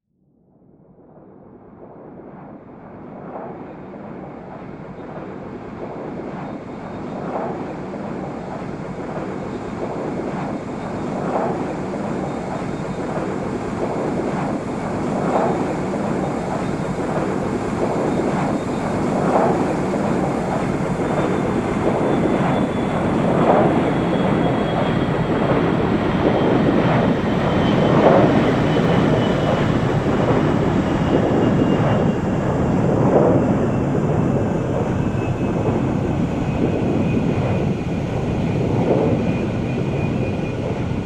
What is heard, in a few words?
Plane Flying By